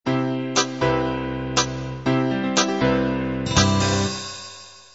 half 8-16beat intro

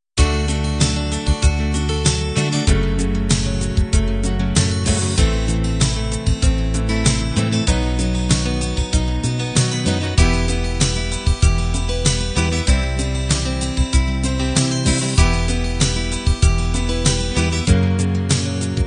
folk ballad

